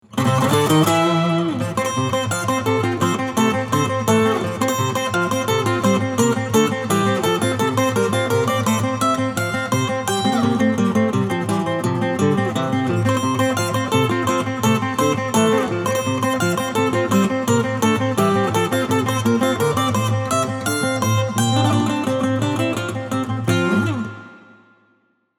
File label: Acoustic